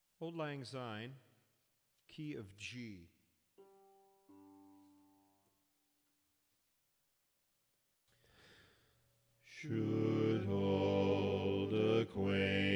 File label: Auld Lang Syne bass